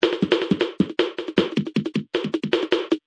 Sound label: jungle